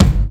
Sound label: treble rich kick drum g key, Royality free kick sample tuned to the G note.